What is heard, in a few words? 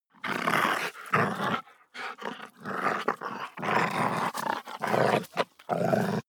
doberman snarl, hunde; natur-tiere